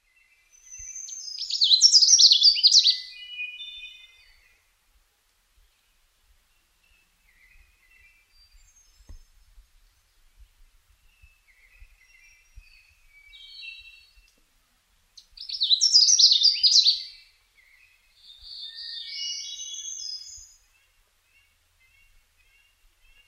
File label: canada warbler